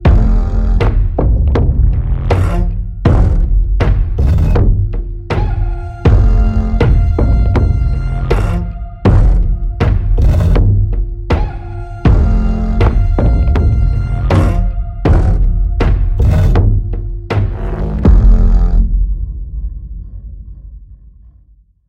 Tension

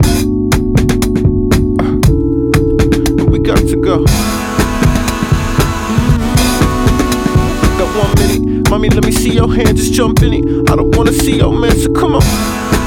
drummer boy sample pack; Splice Sounds; Naji; Mr Drummer Boy

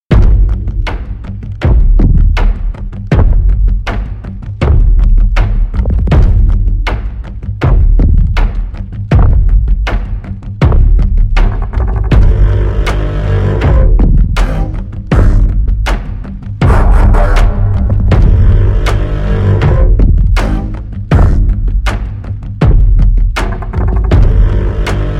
Tension

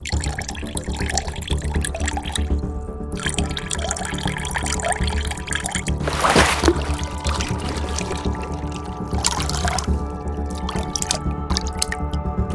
Water, water; SFXtools

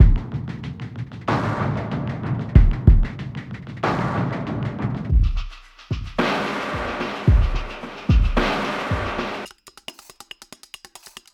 Ambient Minimal Downtempo, drums; Katunchik Sounds

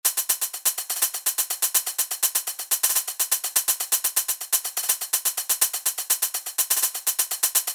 Trashcan, drum loops for organic house; Basic Wavez; Dulus

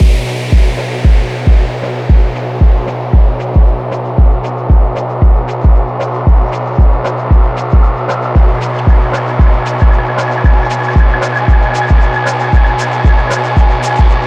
Dark Ambience; Code Sounds

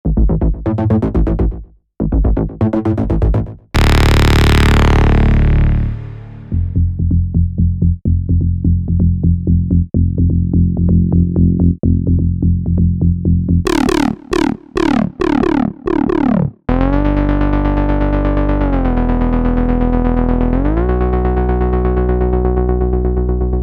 Serum 2 Presets Bass Pack; PML

